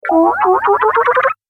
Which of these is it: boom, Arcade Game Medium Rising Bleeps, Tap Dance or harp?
Arcade Game Medium Rising Bleeps